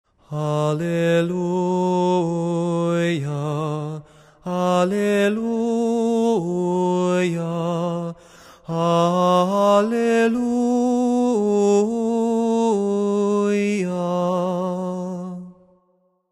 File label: Tone 6 Alleluia